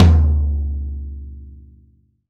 velocity tom; VELOCITY TOM 1

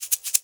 MULTI EGGS; Best Service; World Colours

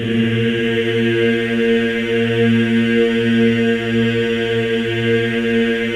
A#3, Symphony of Voices men; MEN EES OCT

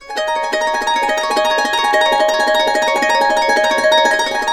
70, Complete Harps; USB Soundscan vol.62; AKAI

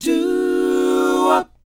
DOWOP G#4, Vocal Planet, R&B & Dance, DOO WOP; ILIO

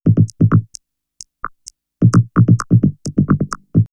Vocoder Loop; X-Static Goldmine; VocoderLoops

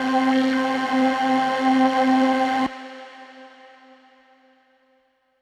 Synth C, Synth Prophet One Shots; Electronisounds

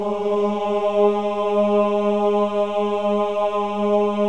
OH G#3, CHOIR OHS; Spectrasonics; Symphony of Voices

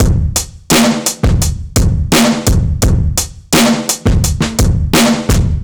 Old Drums 85; analogue circuit; musicradar

